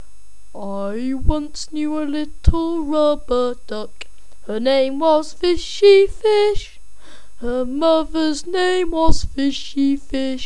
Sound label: Rubber Ducky